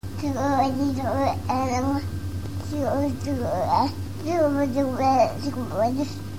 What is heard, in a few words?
squeek, baby; 15mnths